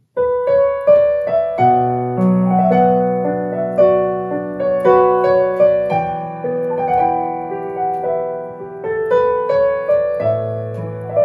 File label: live piano